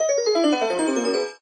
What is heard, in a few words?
scale descending